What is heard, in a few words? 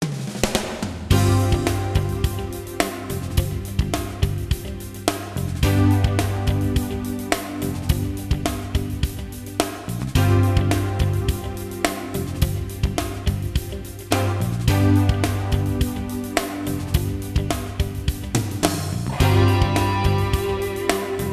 Menu Music